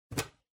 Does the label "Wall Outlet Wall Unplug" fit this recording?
Yes